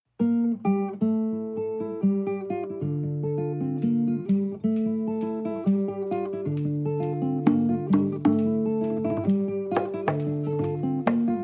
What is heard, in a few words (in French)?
accompagnement seul; Accompagnement instrumental